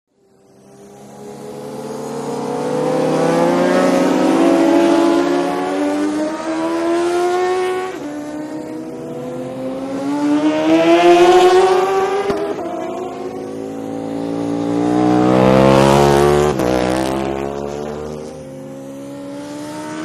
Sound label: Motorcycle Road Racing